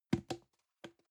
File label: plastic can small fall bounce soft; objekte; dose